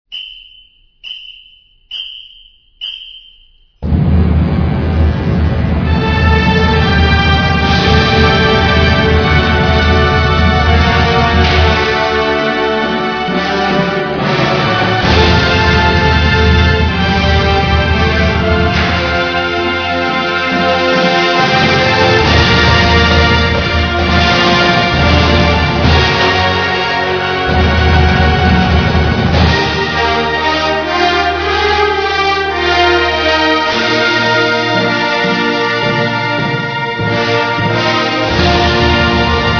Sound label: fanfare; 4th quarter